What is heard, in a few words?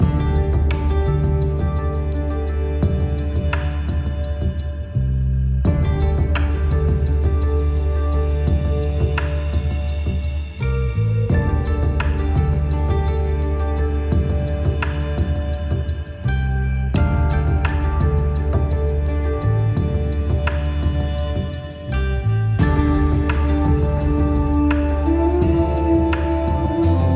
music area loop